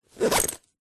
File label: luggage camera bag zipper; zapsplat